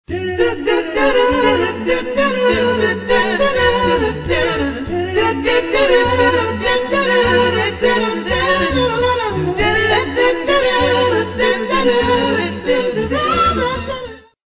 doo wop down beat

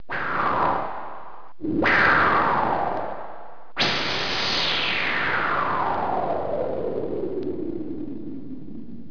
scifi

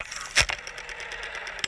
household

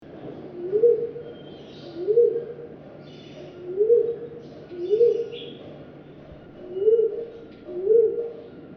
black naped fruit dove

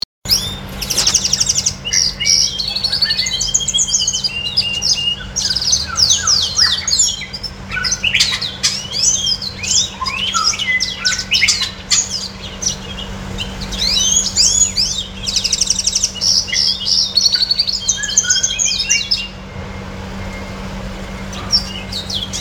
red siskin

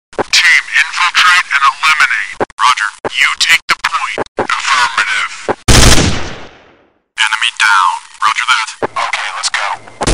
police radio

